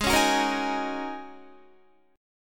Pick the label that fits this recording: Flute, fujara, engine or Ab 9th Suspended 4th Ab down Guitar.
Ab 9th Suspended 4th Ab down Guitar